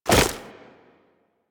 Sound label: Wall Jump Goo